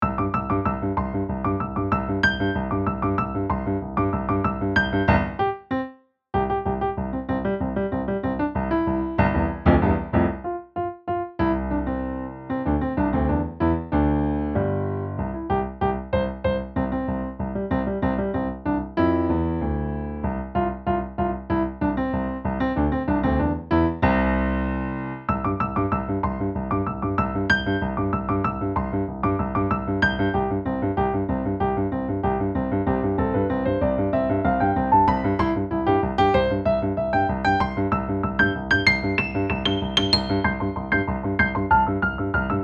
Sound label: fly a kite instrumental